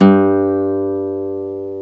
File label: GTR Nylon, Roland